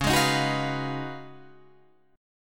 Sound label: Db 11th Db, Guitar, Drop D